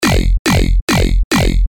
eieibass

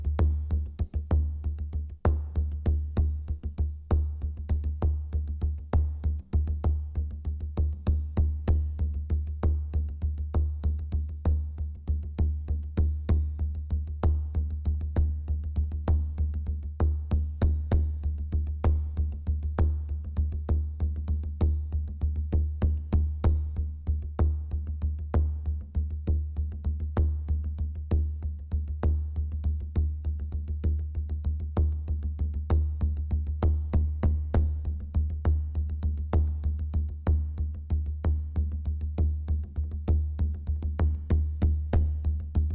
bodhran

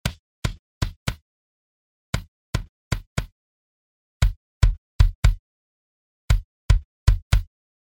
kickbass eq; pultecstyle cubase

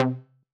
saw

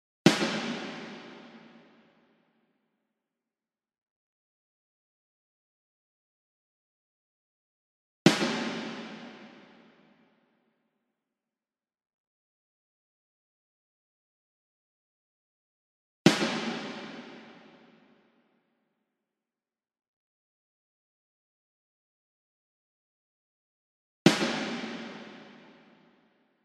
snare

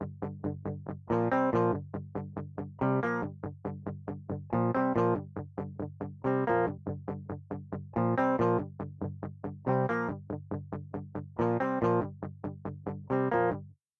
git l di

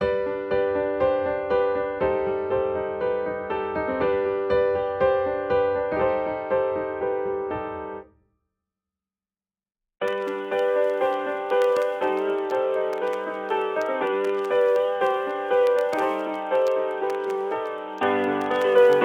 old piano

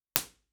impulse

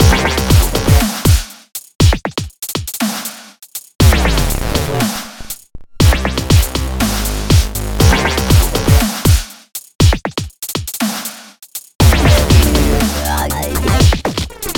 new dubstep

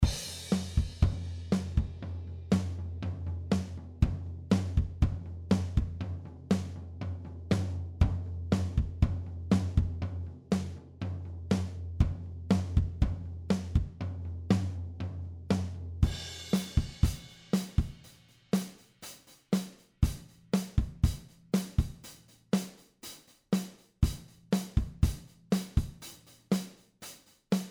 sonor

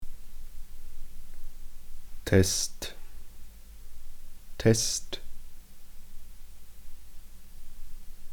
test rode nt1000; rauschen

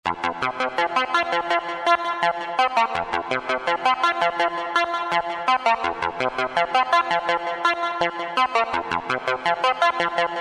syn intro, sequenzer; Escape from N.Y.

